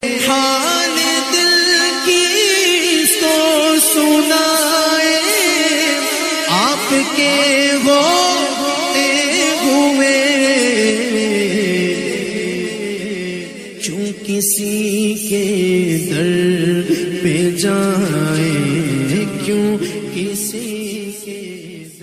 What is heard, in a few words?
Islamic Ringtone